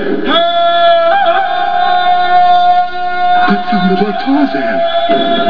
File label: tarzan yell